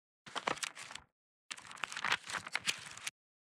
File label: thumb through the pages